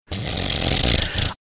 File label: snorting